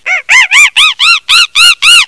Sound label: monkey yell